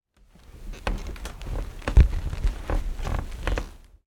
household bunk bed movement top bunk recorded underneath